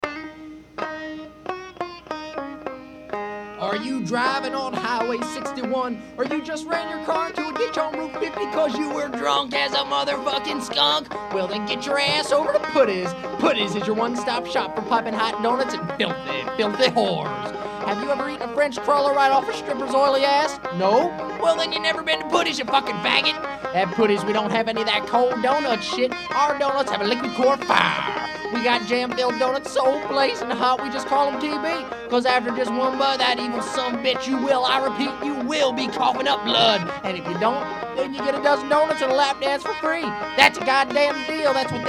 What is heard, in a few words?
Banjo